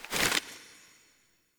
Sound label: Collectable Note Pickup; Objects; Pickups